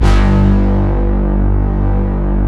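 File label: synth, Prophet Five, Analog; E-MU Formula 4000 Series Vol. 3 – Analog Odyssey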